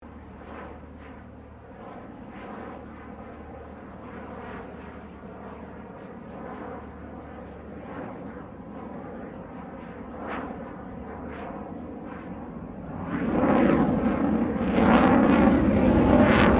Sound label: AIRCRAFT F16 FALCON PASS; Airplanes; Vehicles